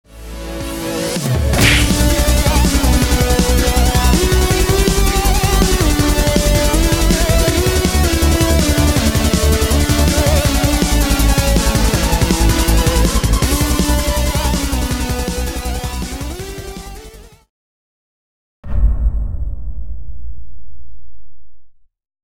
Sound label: Credits music